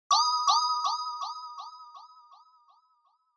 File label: Inspiration Low Delay; Onoma